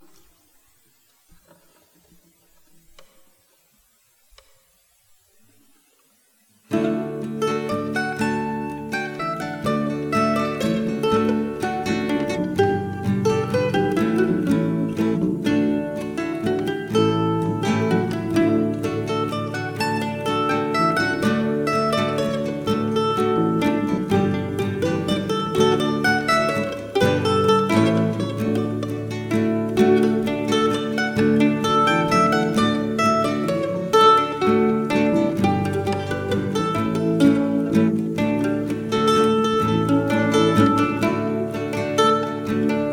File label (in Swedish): vals